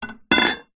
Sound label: metal pole curtain rod small set down on wood floor; foley